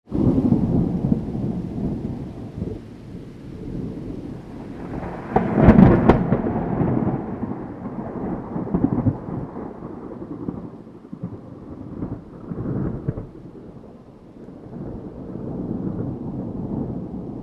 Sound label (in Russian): grom; shum prirody